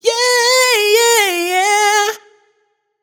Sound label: riff Yeah Yeah Fm wet, Vocal Sample Pack, Raw Vocals, Wet Vocals; Slate Digital Omnivox Vocal Sample Pack